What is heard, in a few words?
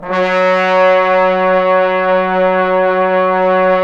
BRS TBNS F, Bone Sec.; Roland - Brass, Strings, Hits and Combos; Bone Sec.3